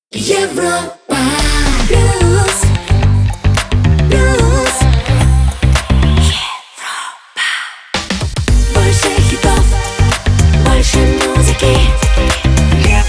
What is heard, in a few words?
Jingle; Europa Plus